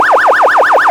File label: hyperyelp, sirens; code3 banshee